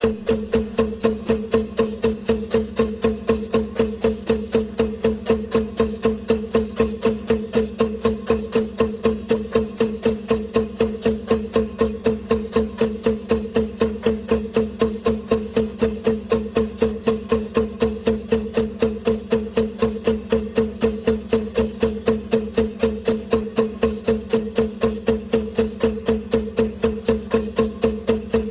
combined hand drum

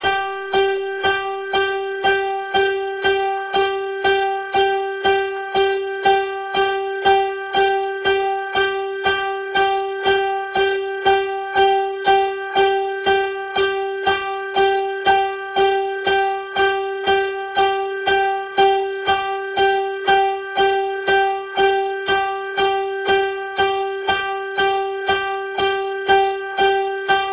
combined piano 120

